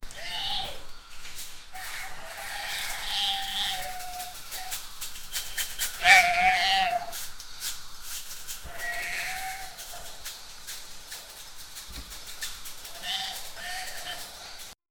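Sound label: sheep, washing, domestic animals; sheep is brushing in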